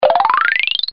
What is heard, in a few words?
lvl up